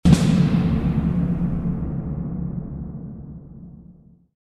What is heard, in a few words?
Jingle woosh; Jingle ROR